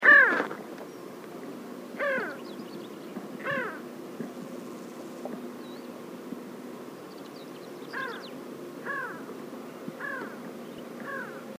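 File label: pin-tailed sandgrouse voice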